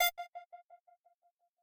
synth

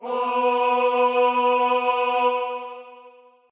choir

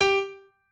piano

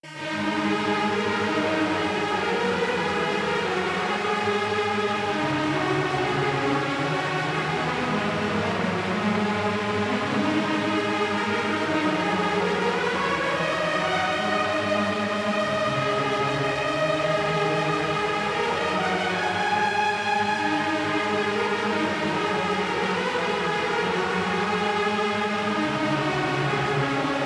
Mainlead reverb

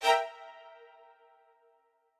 strings

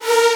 synth lo

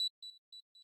arp

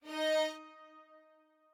strings

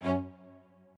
strings

